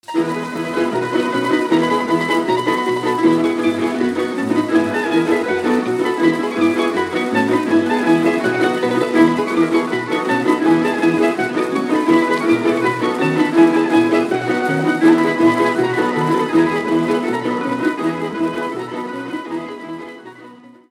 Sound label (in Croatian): Slavonsko Kolo, Croatie